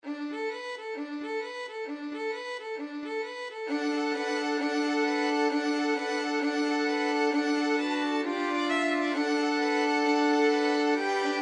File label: jingle bells boogie STRINGS; violin quartet